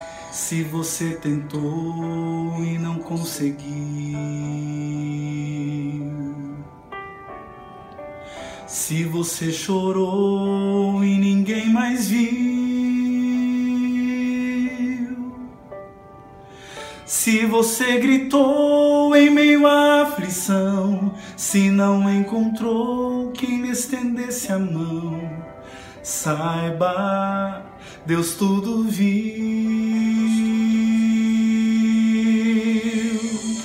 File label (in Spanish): baritono